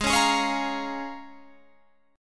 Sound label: A sharp Minor 7th, G sharp